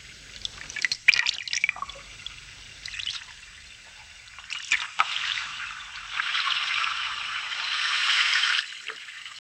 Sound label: sound of cleaning a fresh, fish